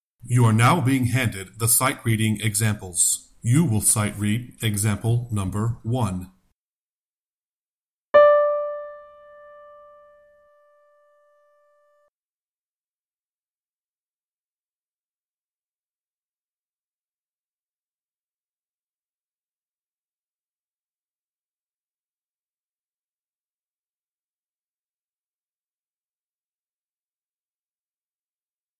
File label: Sightreading Example Soprano